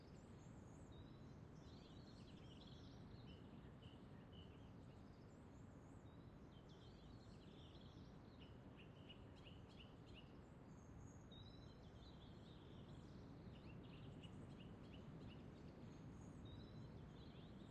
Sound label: Little Room Outside Bird; Game rip; MiSide